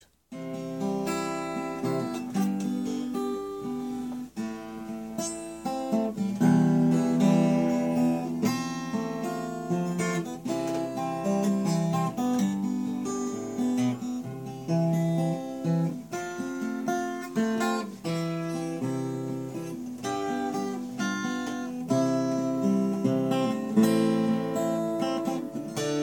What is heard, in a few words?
acoustic guitar solo